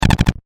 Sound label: Science Fiction Pulse Rifle Machine Gun Short Burst; Battle